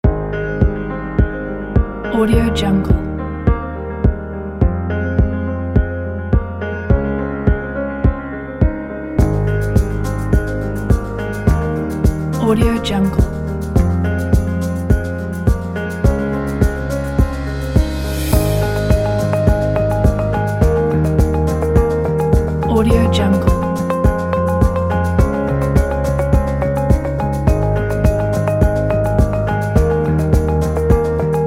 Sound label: piano upbeat ambient kit